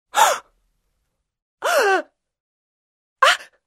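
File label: woman's fright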